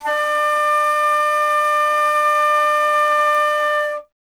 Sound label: Alto Flute